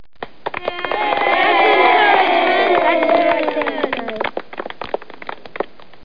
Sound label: baby